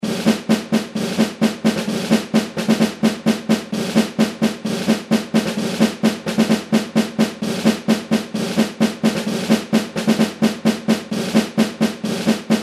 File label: marching snare drum music